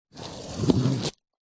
cardboard box taped open knife slice; foley

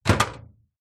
household microwave oven door open

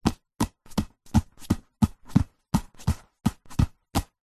soccer ball juggle between hands fast; zapsplat

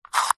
battery tester plastic slider slide; foley; zapsplat

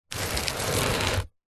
foley wooden box large slide gritty concrete

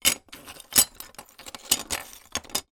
foley tile broken peices movement on table

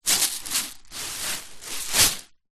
rice dry in plastic container tip movement; zapsplat

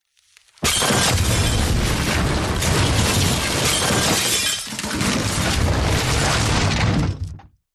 impacts building collapse more glass

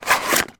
leisure card game box open slide

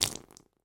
water spill carpet floor

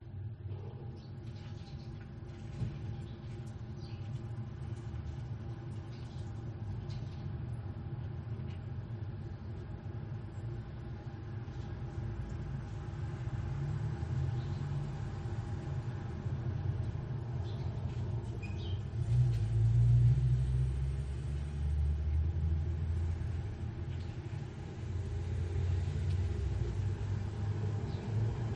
city spring indoor ambience loop; the sound pack tree

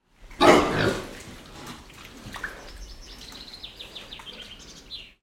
pig grunt inside barn wet sloppy mud

